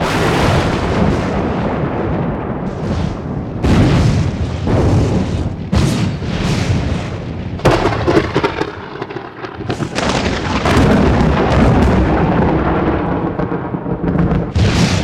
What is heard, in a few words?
thunder overhead; Add ghost sounds.; zm ai pack